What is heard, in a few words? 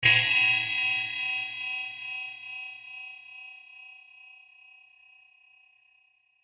space bowl ringmod